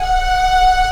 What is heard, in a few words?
ANGELIC STR; AKAI S6000 CD-ROM - Volume 1; Strings